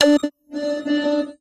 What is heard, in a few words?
Computer Alarm, stinger